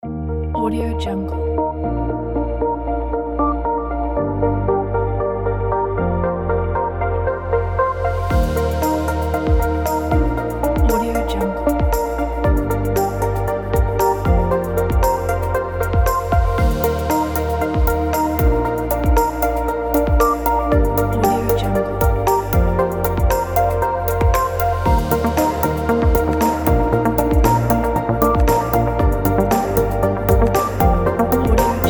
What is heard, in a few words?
technology uplifting